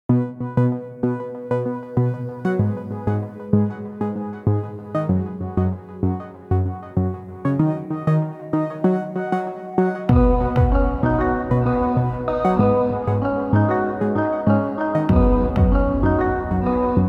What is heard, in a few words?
Glissando